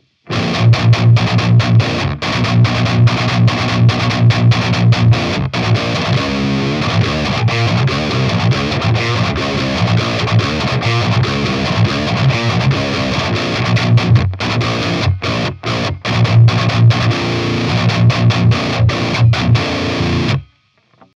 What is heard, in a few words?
djent sunn